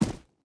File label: footstep boots gravel jump land; Rust